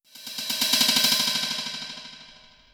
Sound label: snake